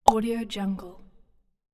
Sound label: pop up